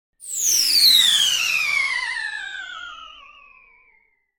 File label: Small Bomb - Firing Whistle; Hollywood Edge; sound designer's toolkit v2